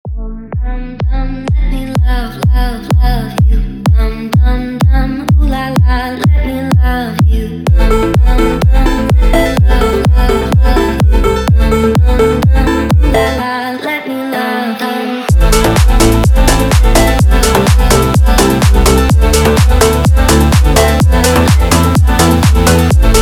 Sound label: slowed, funk